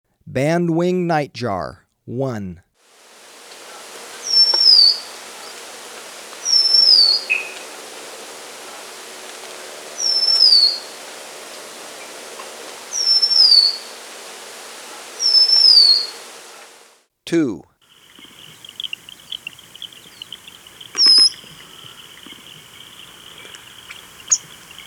Band-Winged Nightjar; S. America

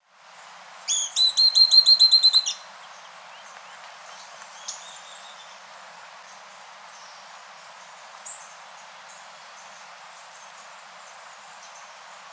Song, Birds of the Costa Rican Cloud Forest

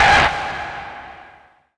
Synth, Techno

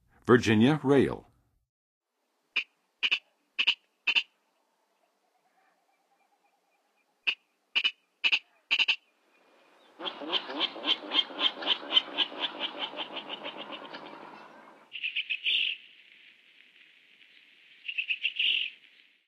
Virginia Rail, Birds of North America; Western USA; Western USA Songbirds

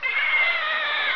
PIG, animal; 动物

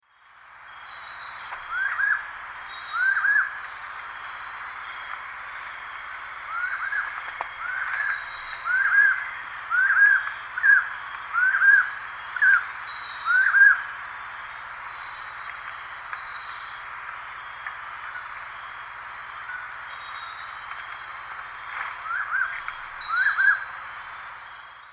Bucco capensis, song; S. America; Eastern Lowlands

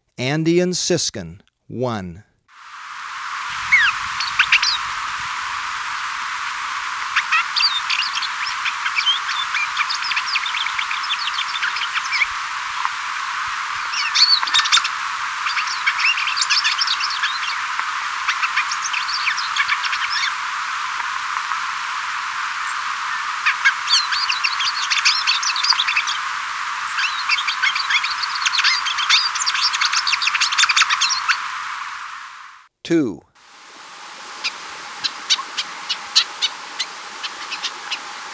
Andean Siskin; S. America

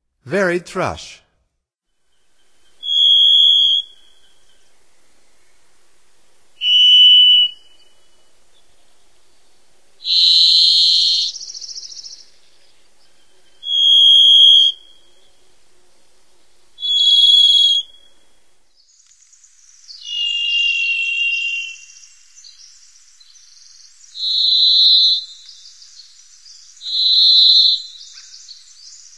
Varied Thrush, Songs of Birds of North America; Stokes

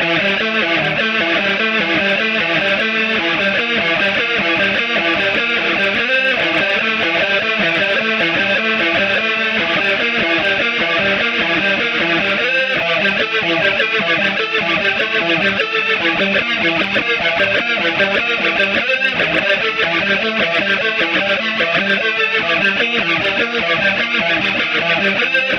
Guitar, Rock

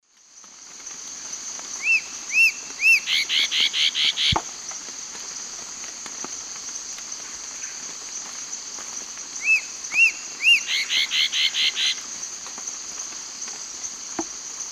Megastictus margaritatus, song; S. America; Eastern Lowlands

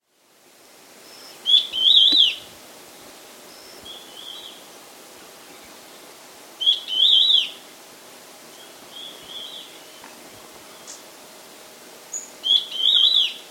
Stripe-Backed Antwren; S. America

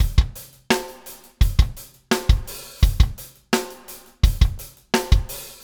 Metal Groove Beat, Rock Drums

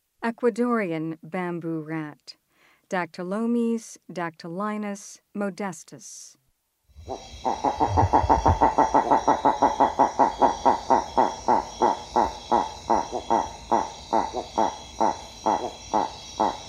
Ecuadorean Bamboo Rat; S. America

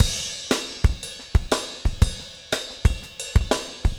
motown 120, loops, 120bpm

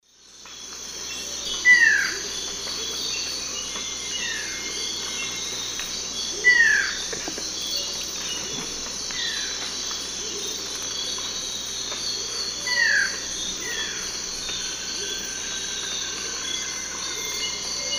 Thamnophilus schistaceus, call; S. America; Eastern Lowlands

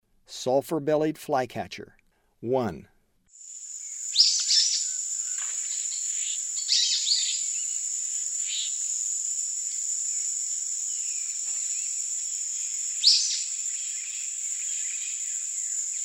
Sulphur-bellied Flycatcher; S. America; Eastern Lowlands

